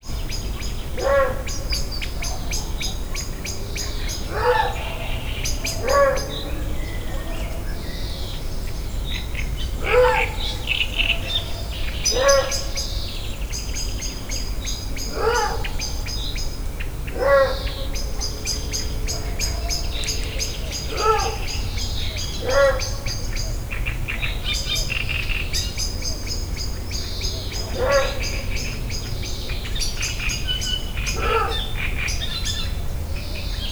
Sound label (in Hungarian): feltehetőleg sörényes farkas és cserregő nádiposzáta; szegedi zoo 2011